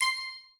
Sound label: rollover; Ringling Bros. and Barnum & Bailey; Wii